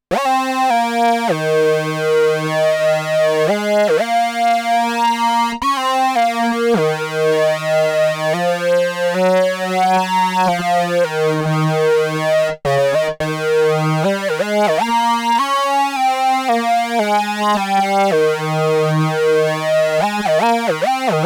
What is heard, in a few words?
MOOG